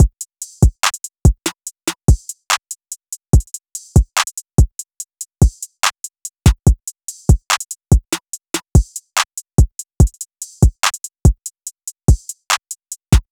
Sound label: Drum Loop 144 BPM, Hip Hop; Drum Loops; Late; Cymatics